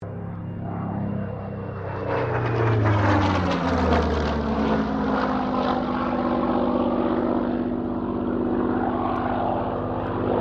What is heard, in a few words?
AIRCRAFT SUPERMARINE SPITFIRE MK-XVI LOOP; Airplanes (Sound Effects:Vehicles)